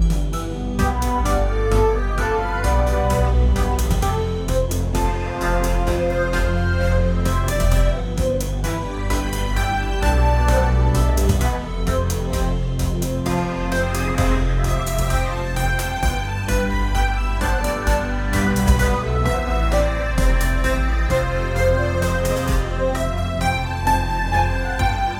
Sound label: sad curiosity adventure; narrative